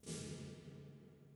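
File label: stereo snare, hi-tech kit; 90 sSampleCDs; Crypton - DrumBase5000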